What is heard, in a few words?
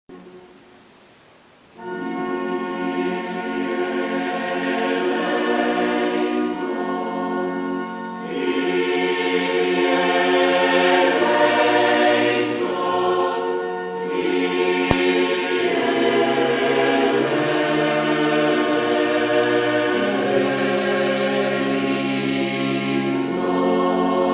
Kyrie Glock